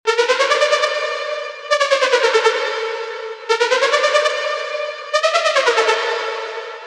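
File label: 140 Loop Groove D minor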